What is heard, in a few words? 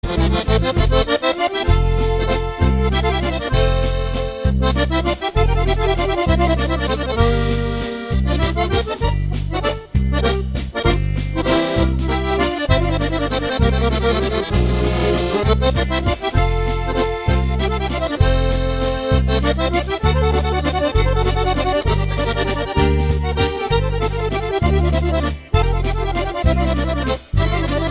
Allegro Waltz